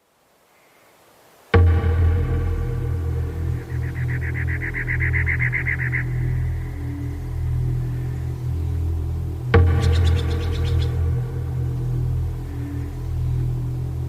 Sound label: Calm; Serious Sam; Chiapas